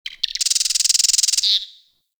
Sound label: Wren Bird; Warframe; The New War; Zariman; Apartment; Scene Four